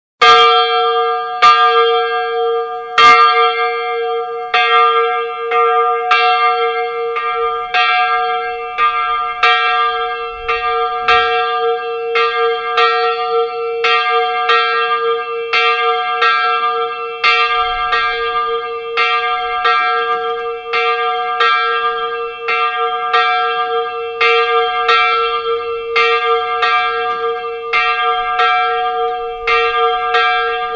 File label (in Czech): Zdíkovec malý zvon